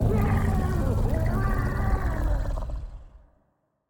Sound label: Roar